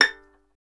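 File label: STR violin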